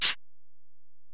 EPerc, Alesis DM-PRO

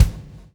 PULL; Spectrasonics - Backbeat

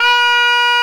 H 3, richter; SoundBytes - Early Patches

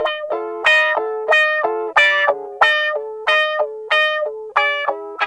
GUITAR

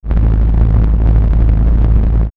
The Engine, synth fx; StarWars; X-Static Goldmine

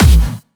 Distortion Kicks, Bassdrums; Vengeance; Essential Clubsounds Vol.4

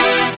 DR. LOOP; X-Static Goldmine 3; CHORDS

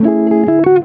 EGUIT CHORDS; Best Service; World Colours

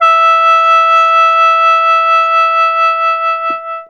sheng e4; WORLD INSTR 2; WOODWIND AND BRASS

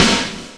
Gated Kit, Percussion

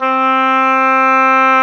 oboe, Roland Orchestral Winds; Roland LCDP04 Orchestral Winds; Oboe Brth 1

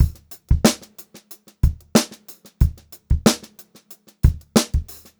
92 ST BEAT, Funk-Groove Drumloops; USB Soundscan vol.38; AKAI

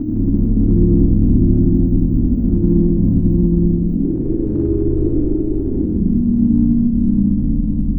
Drone; Sonic Foundry (Sony Creative Software) - Future Retro Dance Excursions

